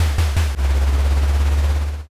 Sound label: engine start